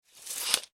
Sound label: industrial tools tape measure metal retract; Metal Measuring Tape Retracts; Machinery; McKinney Sound